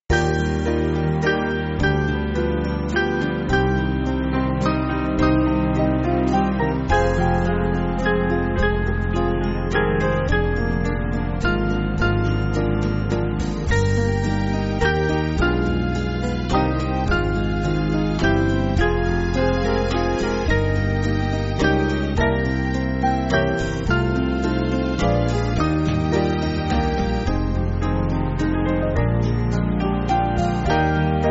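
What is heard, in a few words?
Band, Backing Files for Churches; My New Life; San Gabriel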